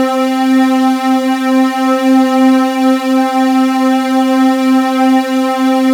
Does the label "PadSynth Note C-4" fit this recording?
Yes